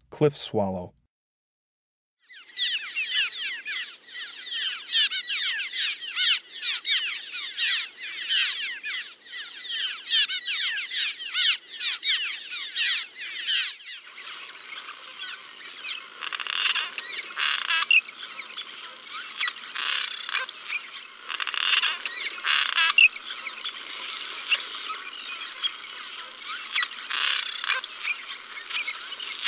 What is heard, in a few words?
Cliff Swallow, Birds of the Chicago Region